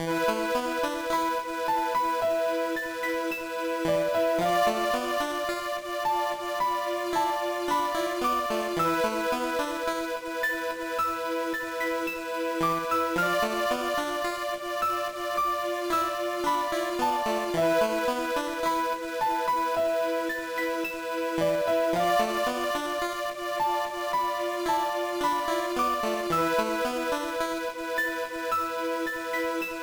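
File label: background music; No No No Puzzle Chailien; GBA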